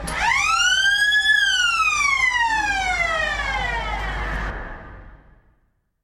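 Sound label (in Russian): zvuk sireni, siren sound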